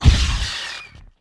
AIR HIT

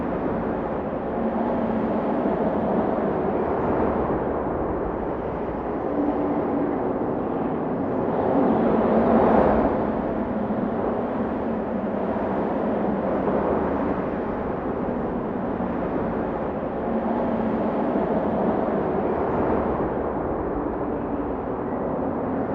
Amb Exterior; Pilot Down Behind Enemy Lines

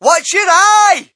crowd, shout; Mike Tyson Heavyweight Boxing

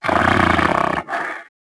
Growl; Alberto; Dino

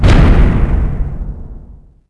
door large end; Theed; Star Wars Obi-Wan; XBOX

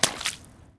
Small Splash

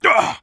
hit; Star Wars Knights Of The Old Republic I; XBOX

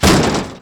bump, sled; WhiteOut

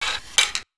Crossbow Pull Back; Weapons

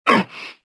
pain; galak

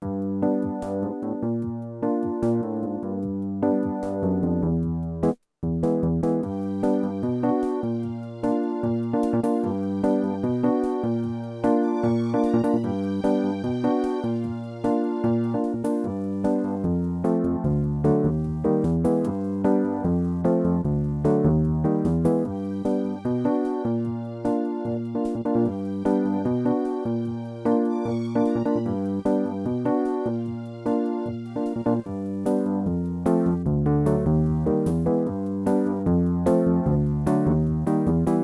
music elevator; TyTheTasmanianTiger3; XBOX

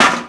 metal bucket hit; bouncyobjects; Tony Hawk's Project 8; XBOX

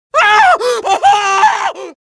scream, pain death, male; Soldier Of Fortune II Double Helix; XBOX

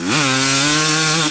Mid, Engine

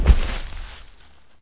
Large walk, Dino

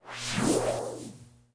force nothing To Do

